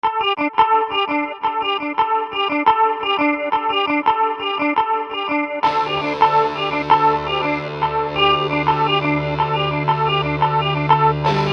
rock band

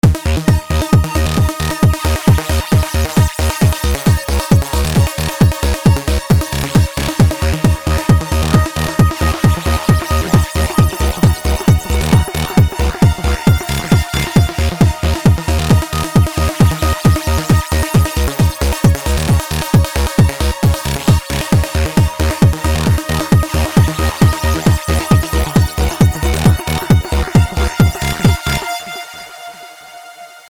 classic trance

